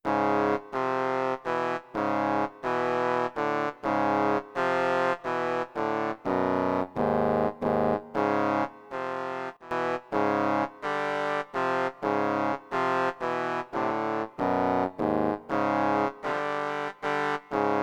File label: tuba